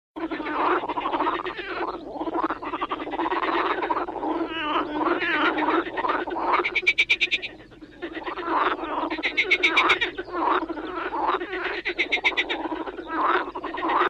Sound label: Big Frog Sound